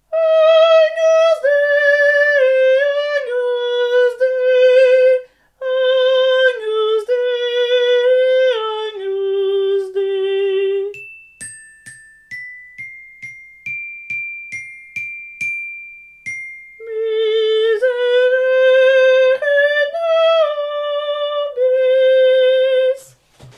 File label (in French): soprani; charpentier; agnus dei